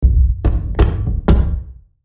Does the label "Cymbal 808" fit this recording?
No